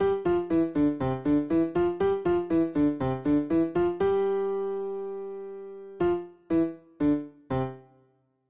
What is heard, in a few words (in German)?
Staccato, klavier